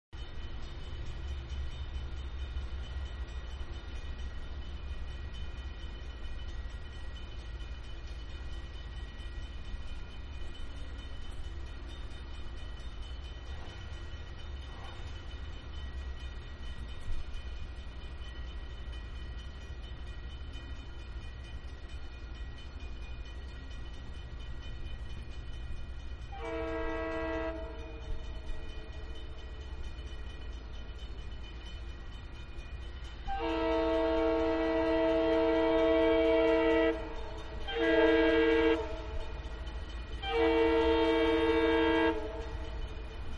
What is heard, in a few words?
Diesel Train Train Pulling Into, Train Pulling Into Station Outside; Vehicles; Trains; Manhattan Production Music